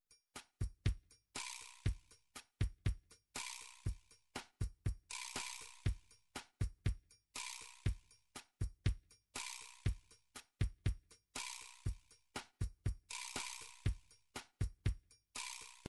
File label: drums, midi drums